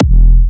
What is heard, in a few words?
Kicks, Big Room Kicks, E4